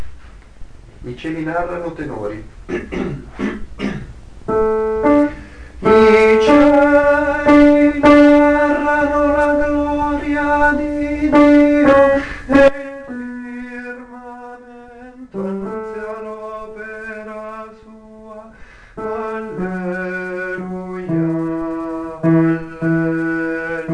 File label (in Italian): tenori; I cieli narrano